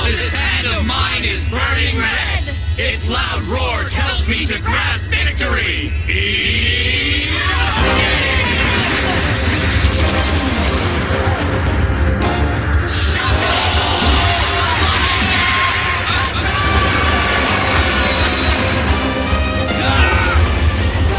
Shuffle; Alliance

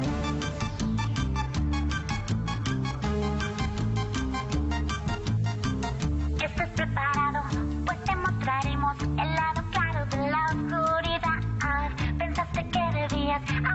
Latino; Ruby Gloom

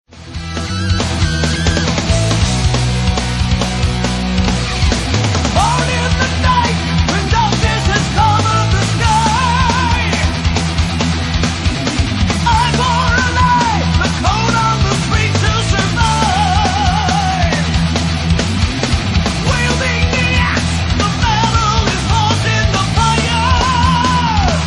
Rocking

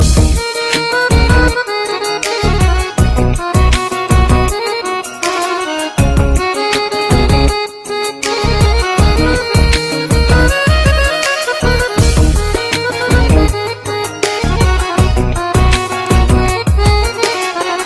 Accordian Soul

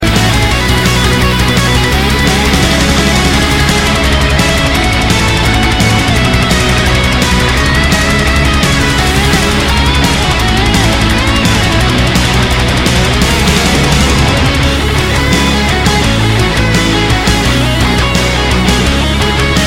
Black Guitar; meloboom

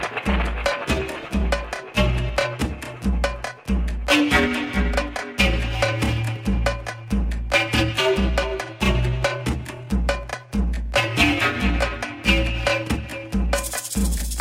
Dub

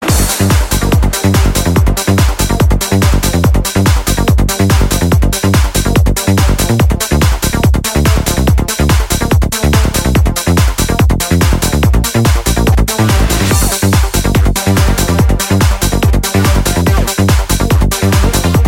Trance

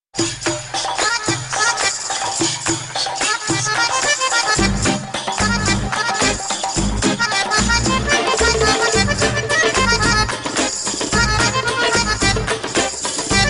Mashallah Beat

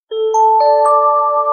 Very Short Tone

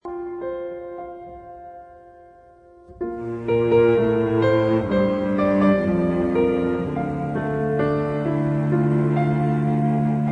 Piano

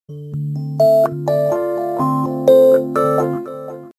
Great Sound Sms

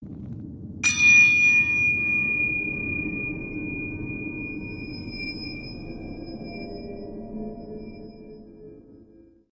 Shrine Bell; Sekiro

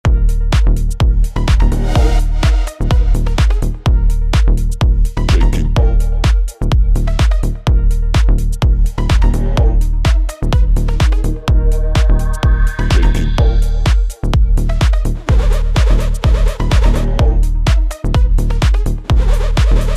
Bass; GTR

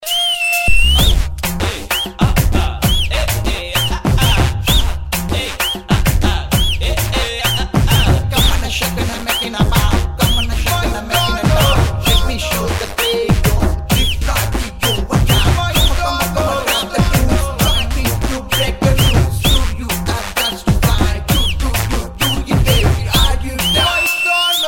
Super Rap

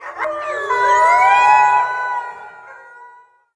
Wolf

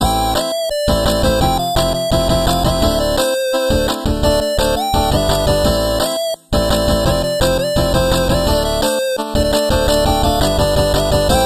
8-bit

